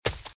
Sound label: Footsteps - Leaves - Sprint - Right; RadwinIsland